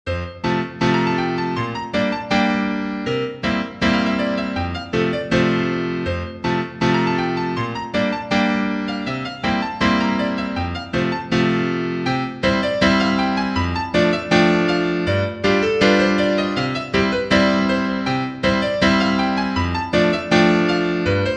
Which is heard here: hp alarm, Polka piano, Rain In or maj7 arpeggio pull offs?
Polka piano